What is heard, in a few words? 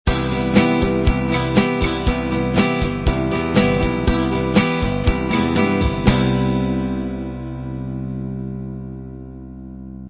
light rock end